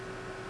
conveyor; resonant-induction; assemblyline

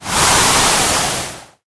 gust; equivalent-exchange-3

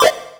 sound hit; godot; platformer

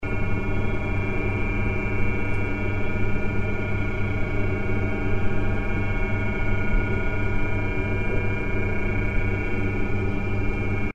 Hydrogen Generator; Mekanism tilera Edition

